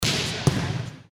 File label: volleyball practice